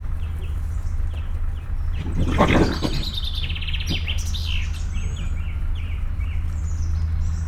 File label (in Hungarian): morognak, rozsomák; szegedi zoo 2011